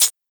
Muted High-Hat Sound A Key, Royality free high-hat tuned to the A note. Loudest frequency: 7994Hz; hi-hat